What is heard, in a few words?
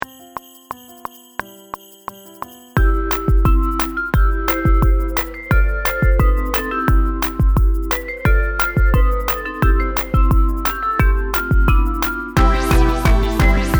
DRUMLESS TRACK 175bpm; ROCKLEY MUSIC; XMAS